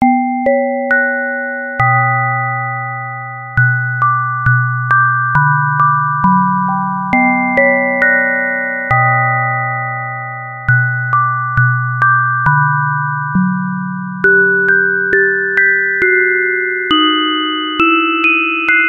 Music Box Ambiance Trinkets

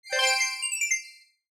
Congrats

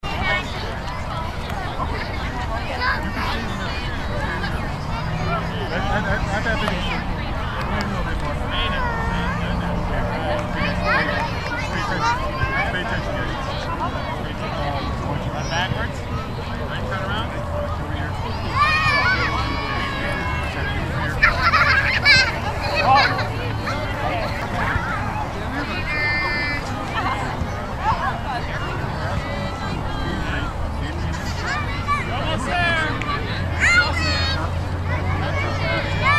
Kids Soccer Practice

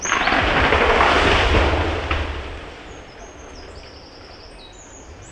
Tree Coming Down

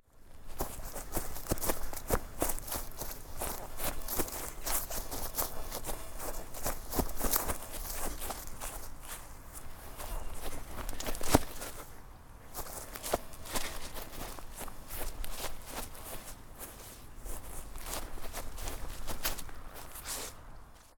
Playing In The Garden Mess Around

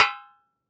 Metal perc

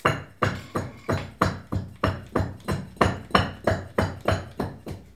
Brick Hits

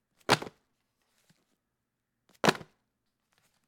Book Hits Floor

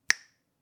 Snap Dry

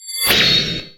Weapon

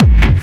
Techno Kick; Techno Kickz

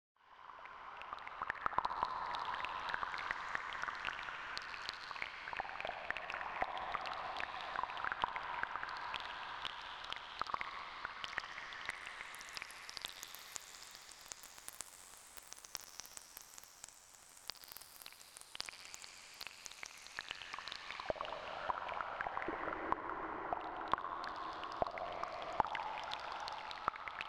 Moon Cave

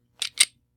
9mm Action Close

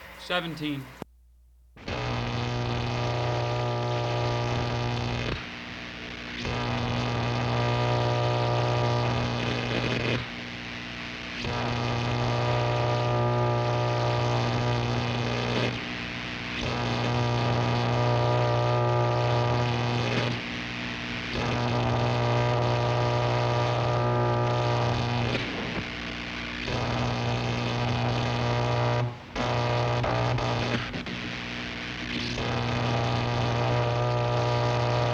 Electrical Buzz

